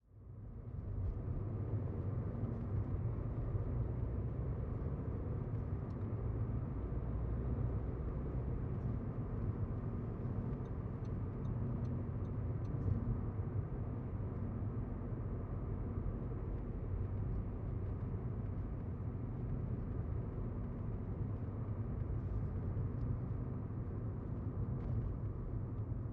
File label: Car Interior Ambience